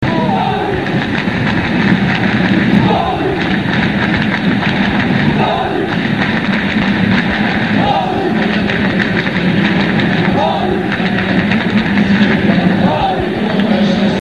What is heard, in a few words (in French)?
clap clap clap paris, chant; football; psg